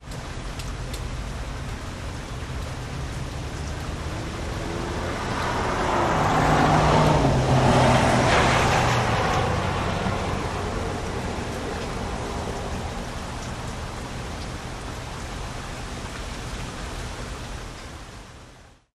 driveby rain, wet traffic

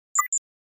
Flash Beep Chirp

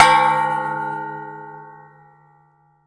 Impact Vari Metal, crashes

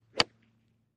Golf Ball Hit W 3 Iron

